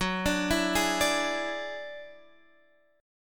Gb 7th Suspended 2nd Sharp 5th Gb down Guitar Drop D